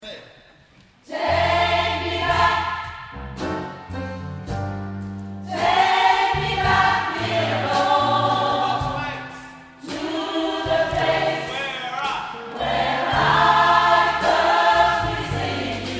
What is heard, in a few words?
alto soprano